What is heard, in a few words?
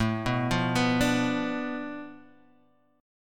D Minor 6th A, guitar chord